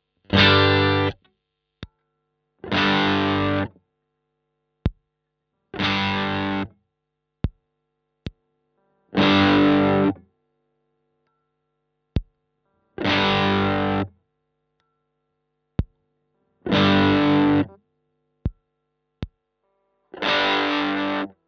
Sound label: BM20 ULTRA Gain max Si Si Tone Knobs